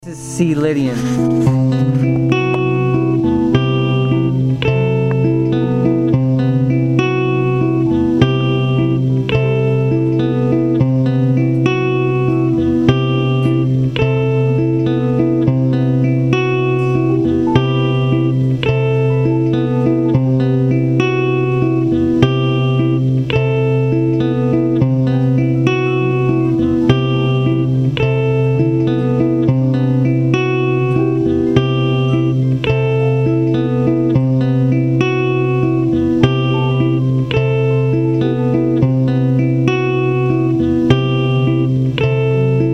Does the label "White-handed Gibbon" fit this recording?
No